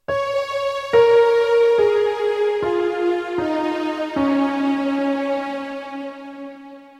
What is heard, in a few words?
bhoopali avroh, raag